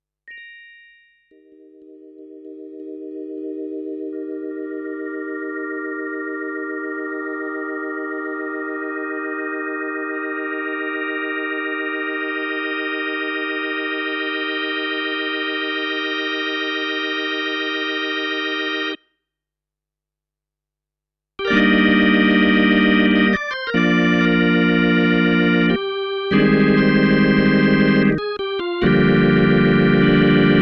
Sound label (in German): Orgel 3 Drawbars